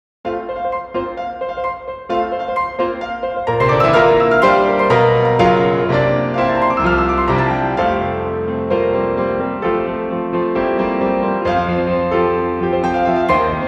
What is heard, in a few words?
Moved by the Ocean Breeze (Piano Solo Ver.); geheime musikbuch himitsu no ongakujou atelier ryza 2 special arrange cd 2020